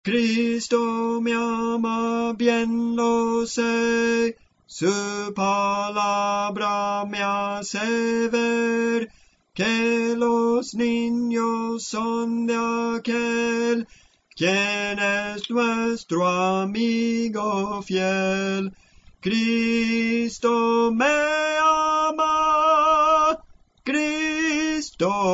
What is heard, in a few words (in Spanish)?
Tenor, Cantos Espirituales